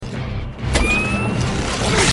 repulsor; iron man

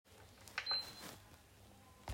playstation 4 beep

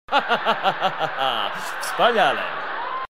hahahaha

